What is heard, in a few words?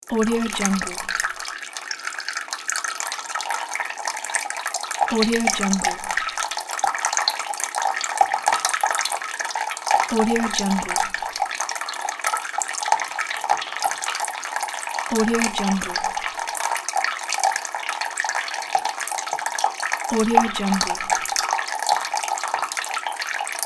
water fast dripping